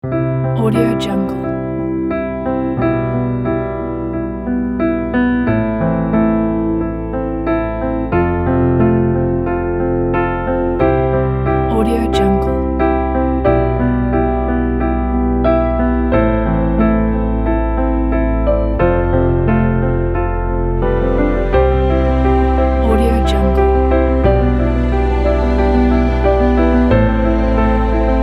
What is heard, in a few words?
beautiful emotional piano